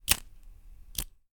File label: LIGHTER; 1000fx